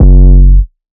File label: 808 Bass Pop F#3; Slate 808 Deep Dive; SD Signature 808s